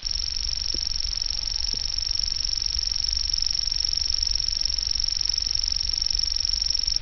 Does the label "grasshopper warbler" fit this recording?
Yes